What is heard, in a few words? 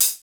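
cymbal, Roland; Club-50 - Foundations Roland; Ext.Snare 2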